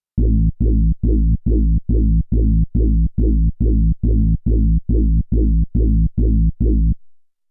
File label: HARD BASS 140 A, Hard Trance, Bass